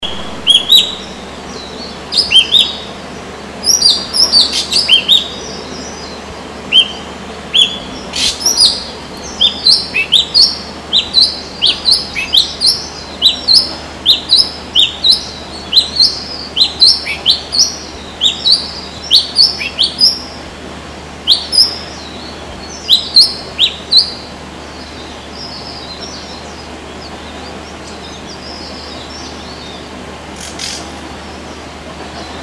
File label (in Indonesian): suara burung cissa thalassina ekek keling